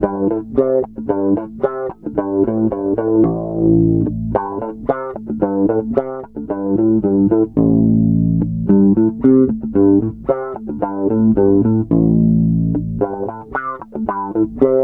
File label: SWEEP 111 C, Bass Grooves; USB Soundscan vol.30; AKAI